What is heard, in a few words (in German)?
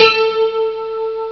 harte saite; ST-XX Amiga Soundtracker Sample Packs